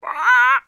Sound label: squawk; Animal Sounds; Various Animals; Short calls